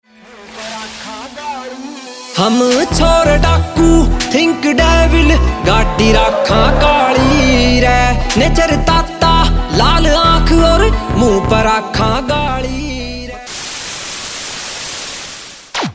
New Haryanvi Songs Haryanavi

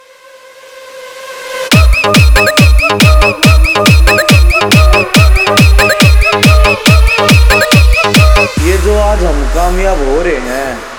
Dj Remix Haryanvi Song

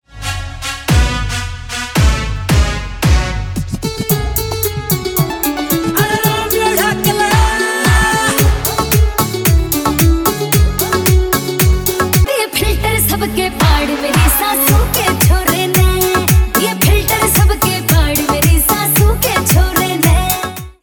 New Haryanvi Songs Haryanavi

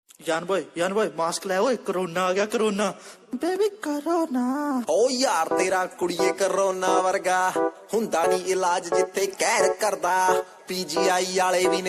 Dhol Remix Punjabi Dj Song

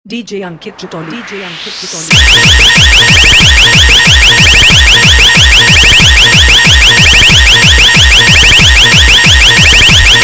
Competition Blast Dj Song Sound Testing

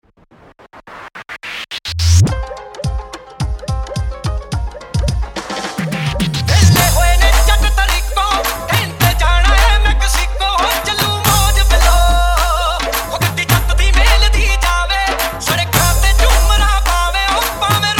Remix Punjabi

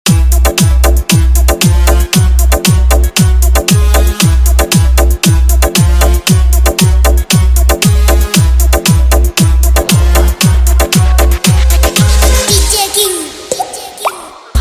Hard Bass Vibration Punch Remix Song

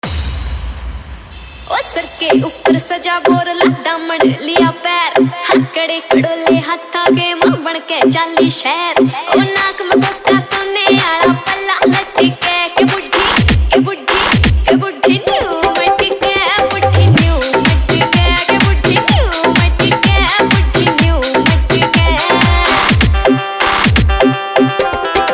Hard Dholki Remix Song

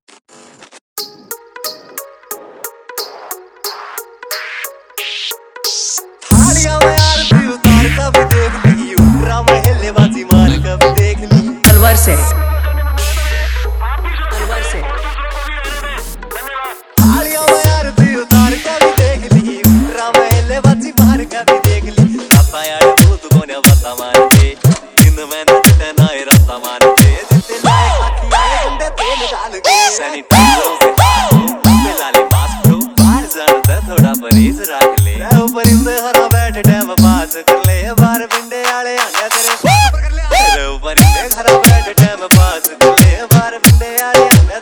Dj Remix New Haryanvi Dj Song

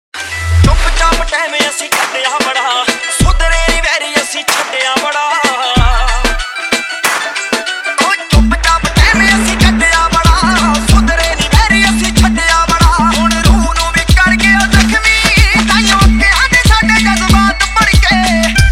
Remix Punjabi Dj Song

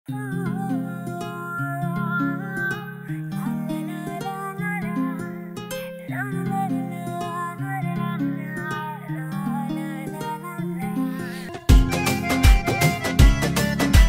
New Haryanvi Song

